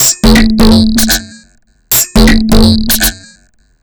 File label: Drums Strange